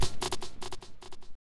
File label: LOOP END, 150 BPM, Drum & Bass; Ueberschall; Drum & Bass Resonance